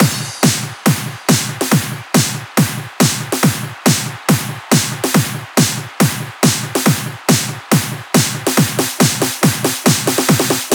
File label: 140BPM 8Bars, HandsUp & Trance Fills; Samuraj; Vengeance Samples